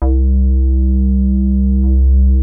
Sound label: JUNO 60 C3